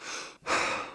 sprint breathe, police

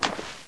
water

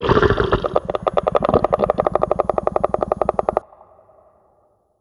chat; gonarch

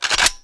FG42 bolt

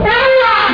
mammoth

